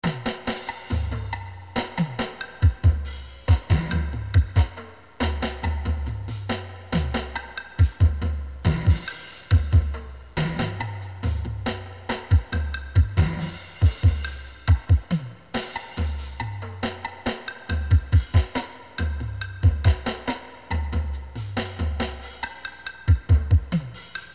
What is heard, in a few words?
divider sequencer